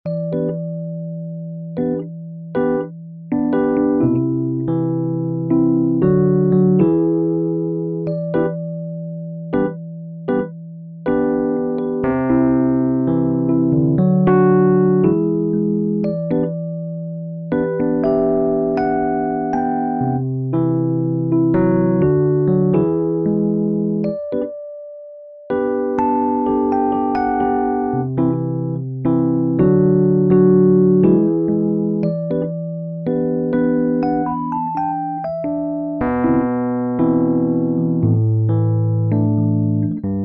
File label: E min Rhodes